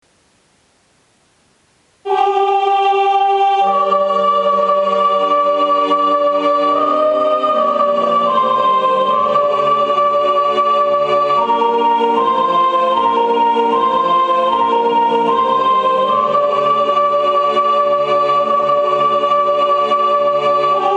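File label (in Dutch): Haec est clara dies - SOPR, klankopname (digitaal); sintjanskoor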